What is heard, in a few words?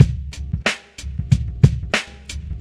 Drum loops, Boombap